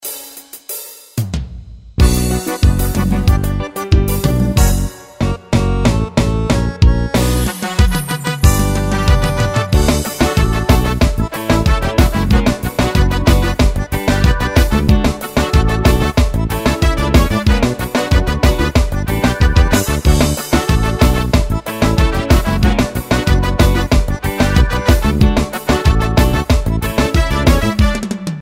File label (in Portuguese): forro introducao; af ritmos